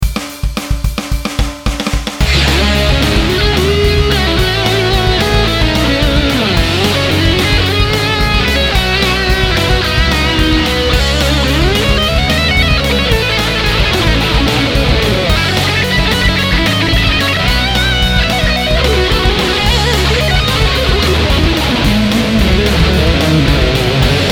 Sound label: 80s metal